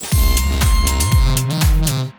win; Amatic; Fruit Box

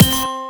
stop bonus; Amatic; Fruit Box

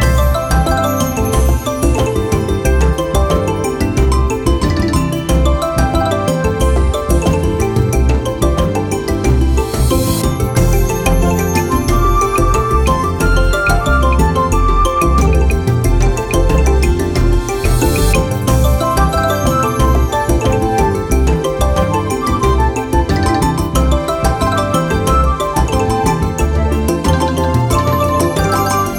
freespin melody; Amatic; Big Panda

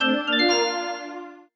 win; Amatic; Book of Fruits

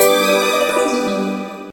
stop bonus; Amatic; Book of Fortune

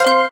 expand; Amatic; Casanova

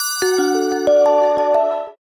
win; Amatic; Casanova

